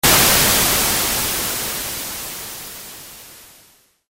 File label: comb; moss